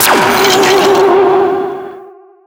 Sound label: Undead; Skylanders Cloud Patrol; Arcade, iOS, Mobile; Skylander SFX